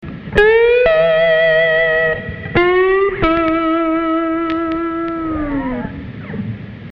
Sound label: slide